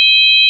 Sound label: sine; ものづくり基礎工学（情報工学分野）; 音の波形と分析